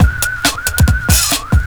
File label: FX BEAT; Big Beat Drumloops; FX BEATS; AKAI